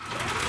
spin start; BARs 7s; wazdan

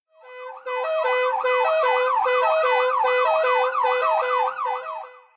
cars siren; Crazy Cars; wazdan

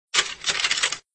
coin insert; Caribbean Beach Poker; wazdan

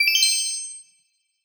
gambler level up; gambling; Beauty Fruity; wazdan

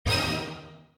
prize hit; Burning Stars; wazdan

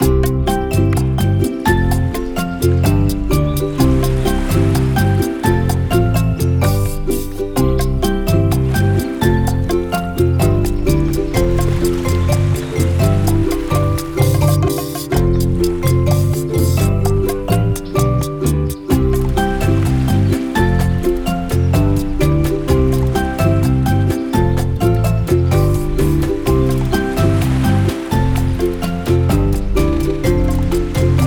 bonus ambient; tutti; Beauty Fruity; wazdan

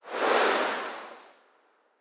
gambler enter; Beauty Fruity; wazdan

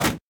roll stop; BARs 7s; wazdan

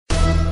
mystery; Criss Cross 81; wazdan

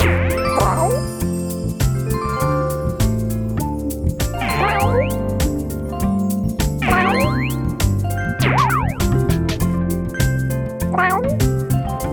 megawin; Colin The Cat; wazdan; bigwin

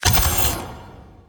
gambling win; DoubleTigersWD; wazdan; ultrafast

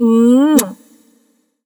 kiss; Beauty Fruity; wazdan

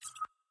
fs button glow; Burning Reels; wazdan

